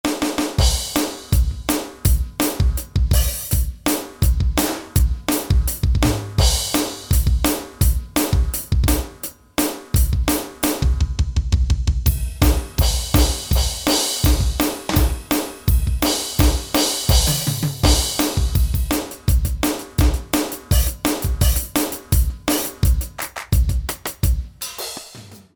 Power Kit 2

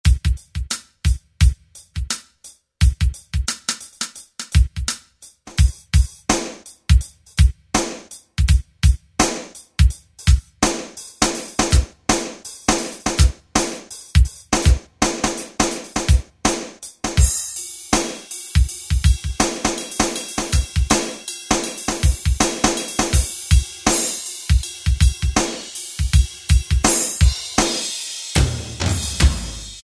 Kit, DRUMS, Kurzweil K2000; Kurzweil K2000 Contemporary ROM; Contemporary ROM Programs and Demos diskette

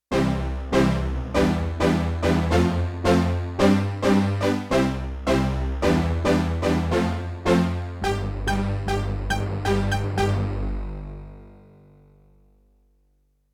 Brass Attack dual, Yamaha TX16W; Orchestral Performance 1

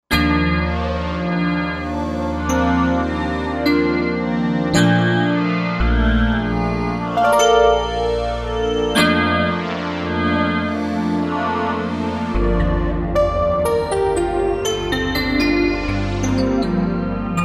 Wave Table, Korg Wavestation A-D

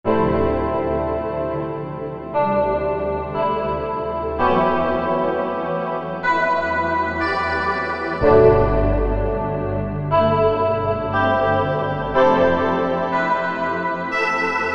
Pad; Korg MSC-14S Sound Effects

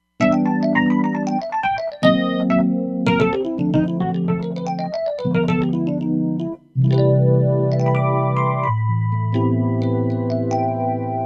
Chuk EP

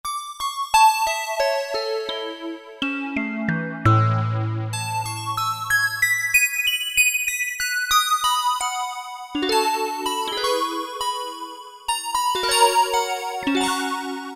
Synth Bells, Roland MKS-70

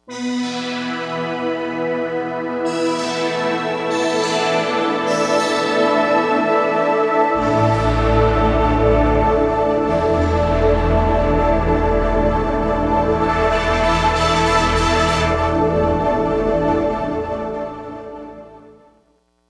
Fifth Breath, synth

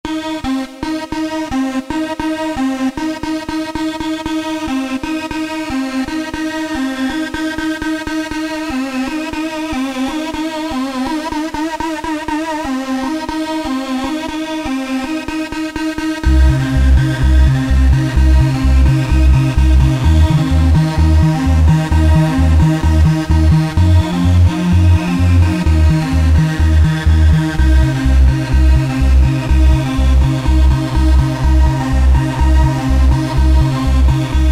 FAT Rave; Roland SRX-05 Supreme Dance

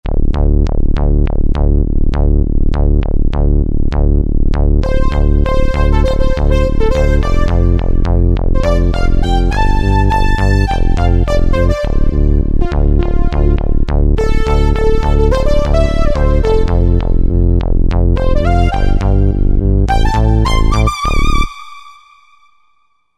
Bass - Lead, Roland JV-2080

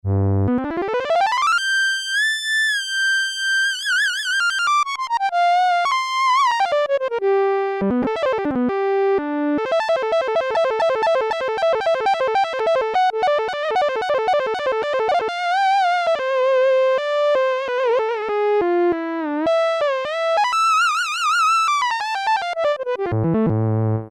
Solo Lead, E-mu Proteus-1; Presets

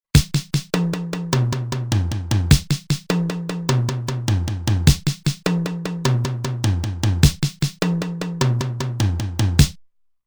Fill In 4-4, Roland TR-505; Factory Rhythms

